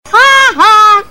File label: haha; css